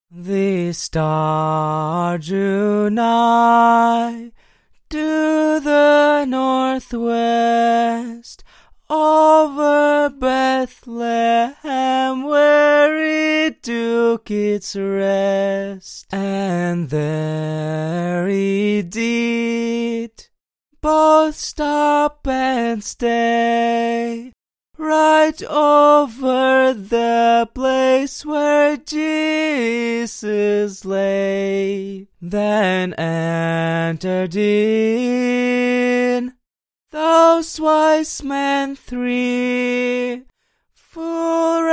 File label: pop festive 60 AM